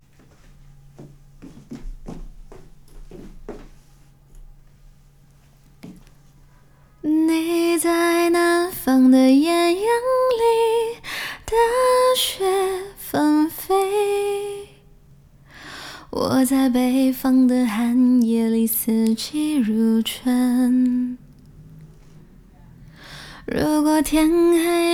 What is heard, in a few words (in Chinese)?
清唱